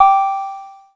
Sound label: nav ding; IGO; android